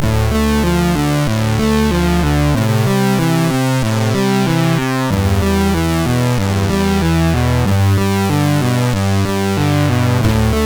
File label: Blues, sid MOS6581R3